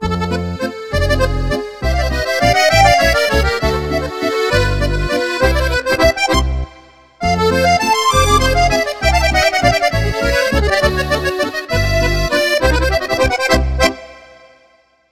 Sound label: MUSETTE